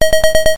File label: landed; Lunar Lander